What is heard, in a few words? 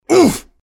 mobster pain sharp